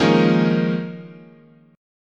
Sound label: D sharp 7th Suspended 2nd Suspended 4th, chord